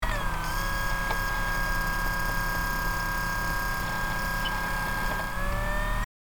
CD or DVD spinning motor whir loop

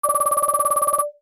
Digital interface scrolling menu ui

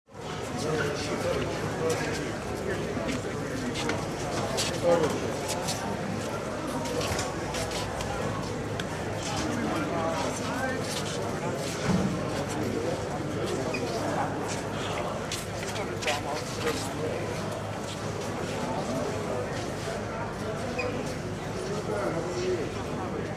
Fruit and vegetable market busy with activity

